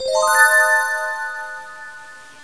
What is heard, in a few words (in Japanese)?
Trinityのベル系サウンド。ディミニッシュ（アップ）。; Diminish1u